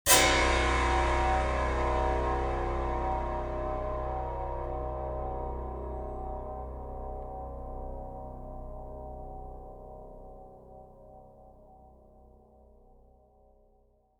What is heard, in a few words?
Piano Eerie Stinger; Layers of Fear Inheritance